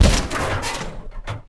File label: door metal kick; Max Payne